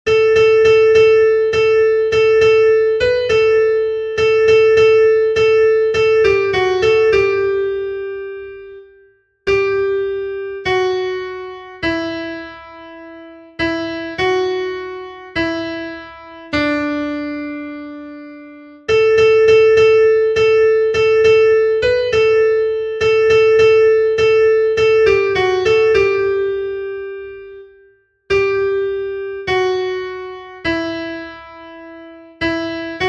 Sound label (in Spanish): SOPRANO; TODA LA TIERRA